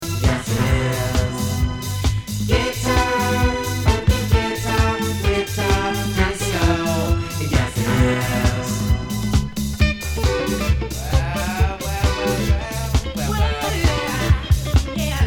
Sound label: GUITAR DISCO